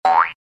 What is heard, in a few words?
Game jump boing